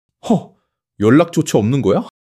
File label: surprise